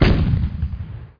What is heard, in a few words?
TANK FIRE; Real War Air Land Sea